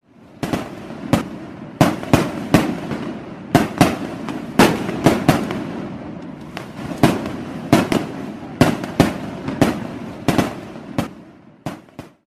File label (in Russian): feyerverkov pirotehnicheskih zvukovyih effektov; festival kino i tv animatsii